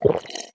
流浪商人饮用挤奶声

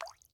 滴水石锥水滴落声

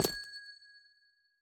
紫水晶踩踏声

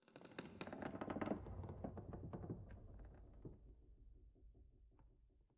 苍白垂悬苔藓声

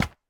树脂砖踩踏声

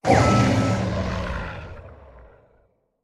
死亡声; 监守者

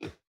犰狳环境声

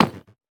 下界砖踩踏声

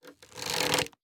弩装填中段声

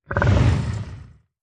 僵尸疣猪兽待机声

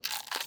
熊猫进食声

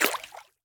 美西螈攻击声

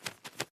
鹦鹉飞行声

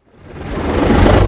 音爆蓄力声; 监守者

